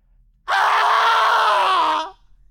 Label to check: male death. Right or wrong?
Right